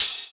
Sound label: Open Hhat, Hihat; Equipped Music; Loungin House